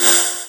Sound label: Chords Tones; Equipped Music; Loungin House